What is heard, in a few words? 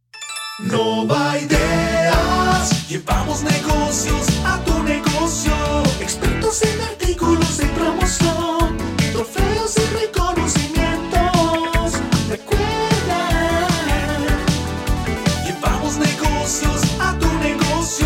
Jingle; NovaIdeas